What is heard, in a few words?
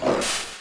phantom wolf die